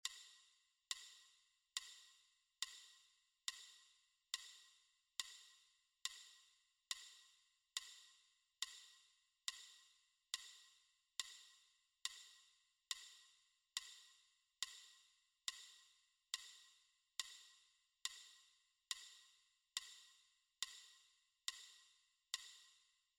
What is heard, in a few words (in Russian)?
метроном 70